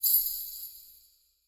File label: Shimmer Hit; SoundsCrate